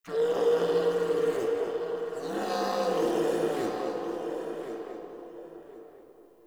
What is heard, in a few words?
Chatter Distant Growl Moan; Ambience; Misc Outdoor; Warframe; Infested Eris